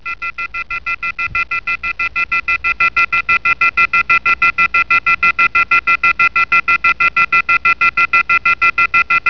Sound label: OFF HOOK